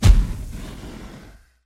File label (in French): Magie disparition